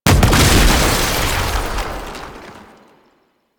Sniper Alt Fire Proj Explosion; DE; Warframe; Frumentarius; Exalted Sniper

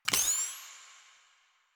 Pistol Laser Aim; Weapons; Warframe; Tenno; Lasrian

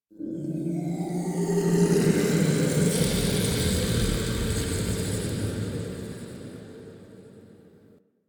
Interior Texture Gurgle; Ambience; Misc Indoor; DE; Warframe; Infested Eris

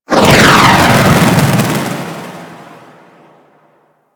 Jet Polearm Charge Whoosh Flame, weapons; Grineer

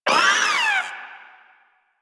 Maggot Bark; Warframe; Proto Infested

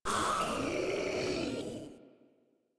Proto Infested Ancient Vocal Pain, Enemies; Warframe

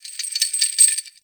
Under City Chain Creak

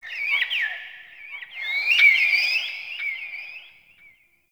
Forest Open Glade Bird Med Two, Day; Ambience

